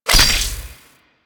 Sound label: Crp Bow Fully Charged; Warframe; Weapons; Corpus